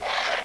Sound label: slide; CHECKERMANIA 1.04